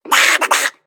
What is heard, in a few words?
Fire Alarm; Ducking; Alarms; World Of Warships